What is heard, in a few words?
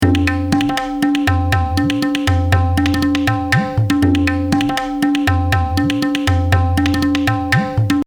Tabla